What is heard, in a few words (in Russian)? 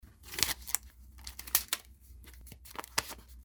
eshhe zvuk rezinki; Звук купюр, долларов